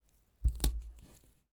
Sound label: Tape on Glass; Footsteps; Haunted Bloodlines; SFX Producer